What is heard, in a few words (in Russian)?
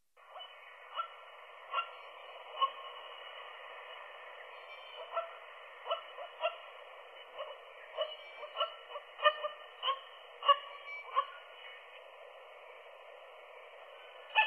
sredniy dyatel golos